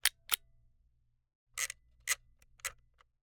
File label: Camera, Kinusa K77, 50mm lens, plastic, snapshot, wind film; Eiravaein Works; Vaeyan IV